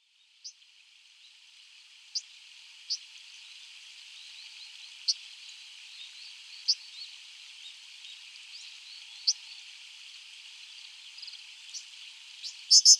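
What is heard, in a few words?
kawagarasu, Brown Dipper; hyogo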